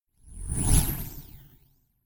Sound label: Whoosh Sci Fi Space Vehicle Small Fast, Whoosh Space Vehicle Small Fast Spacecraft; Spacecrafts; BBFX